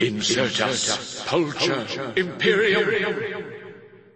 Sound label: chant